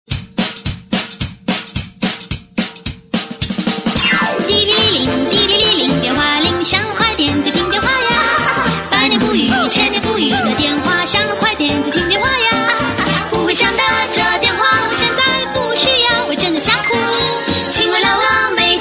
Diriri ring; Samsung; GT-S5580

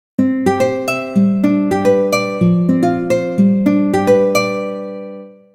Guitar Solo, ringtones; Asus Zenfone-3-Deluxe

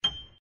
Keypress Standard; GFive; Blade-X-F600

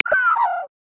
Magpie, Australia; Xiaomi Redmi-Note-8-Pro

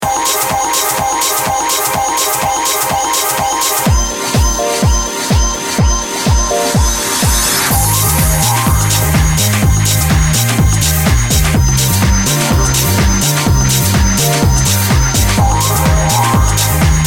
Cycle Beep, ringtones; LG VS740 Ally

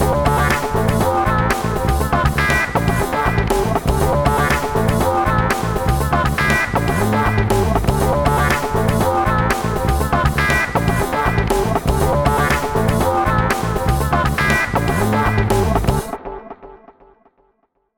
Flute fusion; Micromax Andro-A60

